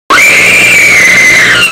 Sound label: scary scream